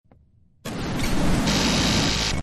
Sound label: glitch; doors